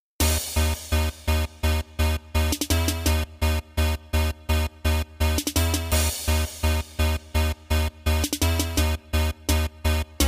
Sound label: bounce instrumental